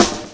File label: snare 1; virtual drum sounds